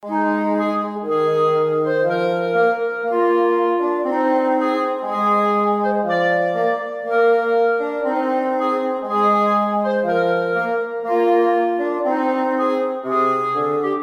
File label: Ab Lydian